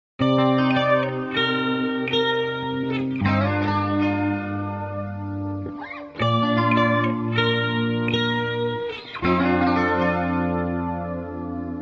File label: lush chorus guitar wet